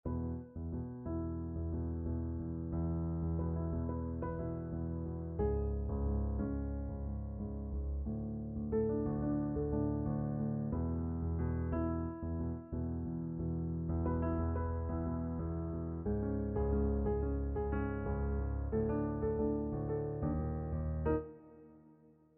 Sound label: Piano